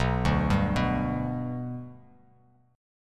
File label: B Minor Sharp 5th B Bass Standard, chord; Bm#5 arpeggiated; 7,5,5,4; JGuitar